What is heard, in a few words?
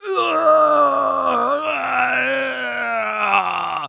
Human Male Groan In Agony